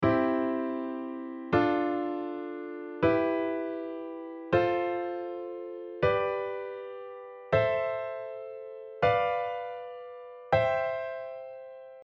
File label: diatonic triad